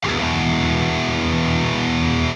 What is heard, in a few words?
shred; metalman